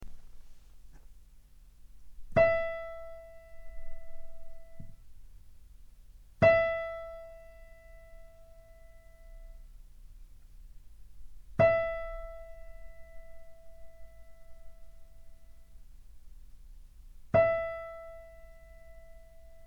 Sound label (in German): Stimmton E 440; Play-Along für Instrumentalist innen